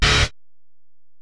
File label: Bell, Alesis DM-PRO